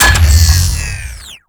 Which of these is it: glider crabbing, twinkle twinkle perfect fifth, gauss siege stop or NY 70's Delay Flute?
gauss siege stop